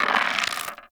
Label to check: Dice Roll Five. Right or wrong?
Right